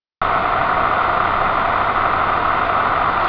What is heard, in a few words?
dpmr pmr